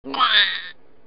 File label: lizard throw